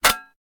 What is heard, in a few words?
metal hit; firefight